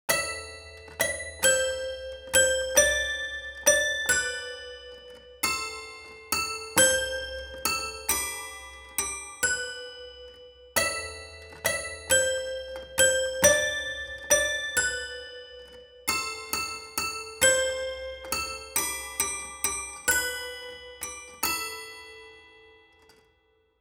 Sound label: toy piano; Muzyka Ilustracyjna